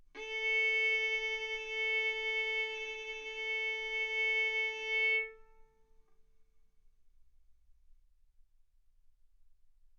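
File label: Violin arco mf A string A4 B4 stereo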